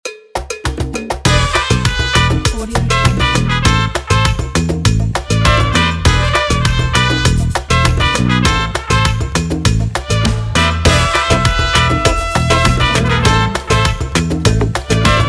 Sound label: latin cuba logo